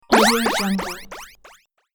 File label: hyperspace spell